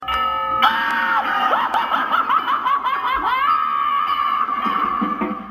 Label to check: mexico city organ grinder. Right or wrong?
Wrong